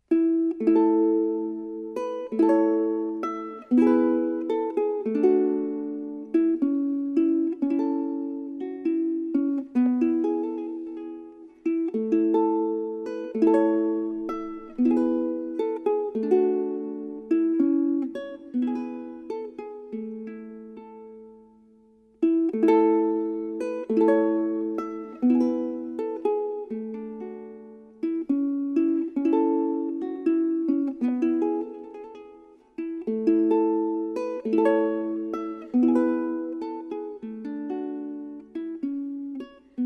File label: Ukulele